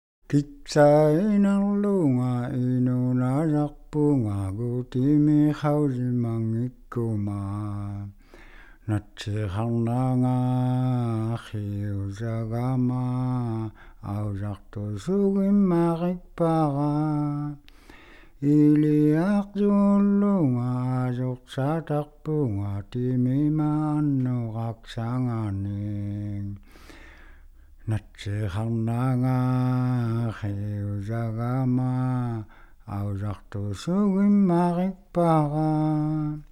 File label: Survivor's Song, INUIT